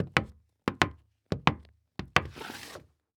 Foley Footstep Hard Sole Dress Shoe Walk And Slide On Hollow Wood Surface; Foley Footsteps